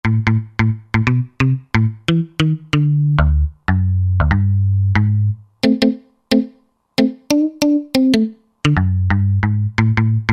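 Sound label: Muted Guitar; Roland JV-2080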